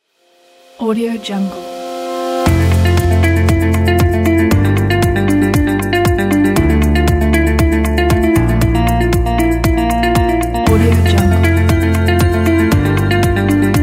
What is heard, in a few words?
uplifting emotional